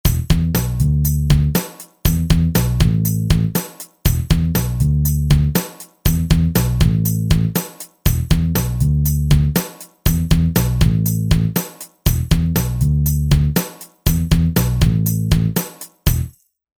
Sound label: Rock